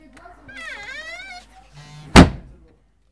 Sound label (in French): La porte